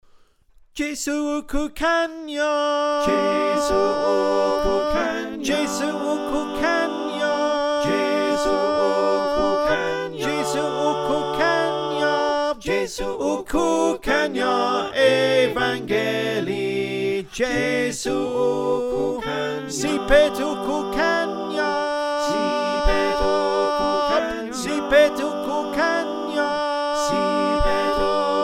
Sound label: Tenor Call; Jesu Ukukhanya